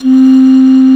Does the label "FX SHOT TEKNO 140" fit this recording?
No